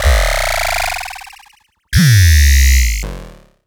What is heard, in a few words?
LFO, Noise